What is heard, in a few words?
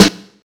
dry steel snare drum g key, snare drum tuned to the G note